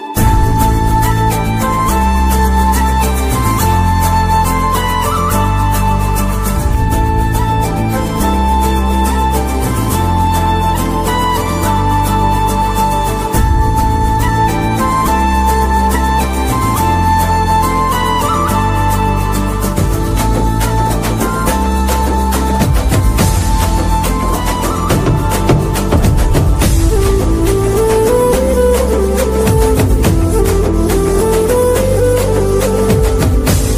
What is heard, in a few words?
Bgm Ringtone; Venky Mama